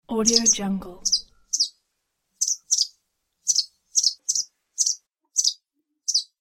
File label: sparrows